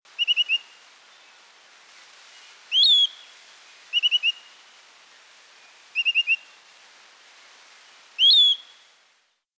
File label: Piratic Flycatcher